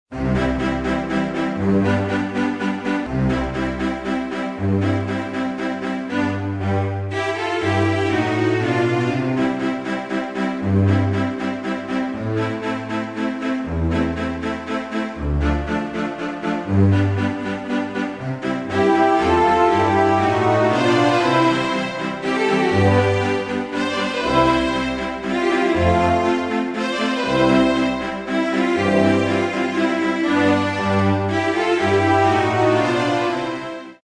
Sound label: classical minuet